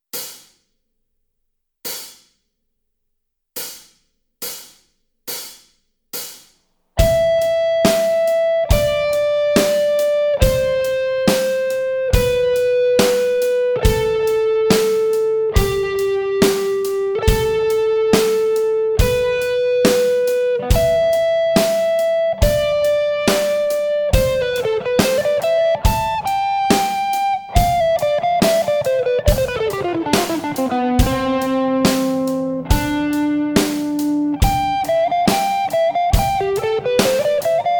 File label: Canon C Melody, guitar